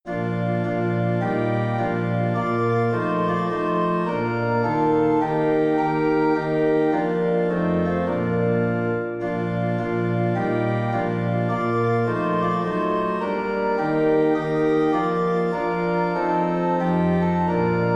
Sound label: All for Jesus great Redeemer 2 verses Organ; Beecher